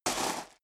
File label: step sneaker gravel medium slide short; schritte